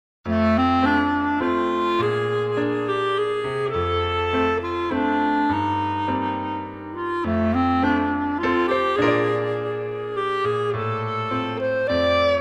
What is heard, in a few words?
Old Man Waltz, lighthearted comedy